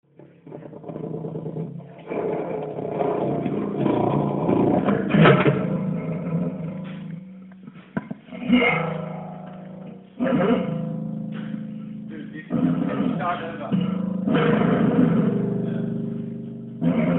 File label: Long String Chair Door